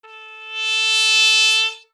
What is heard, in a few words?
trumpet